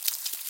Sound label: step, silverfish; mob; Minecraft